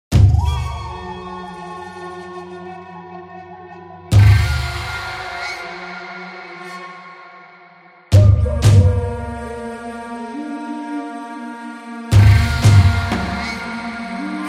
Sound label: Africa Drums